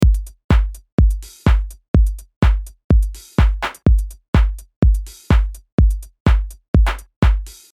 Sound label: Drum Bus Compression